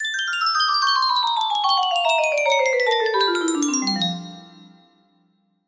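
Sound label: just notes, music box melody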